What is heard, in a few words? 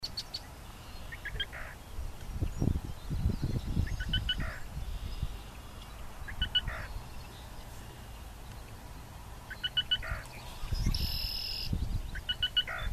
black rail